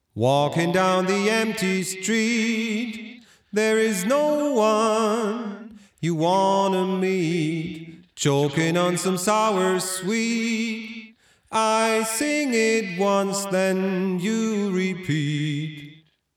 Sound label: Binson Echorec Vocal Swell; T-REX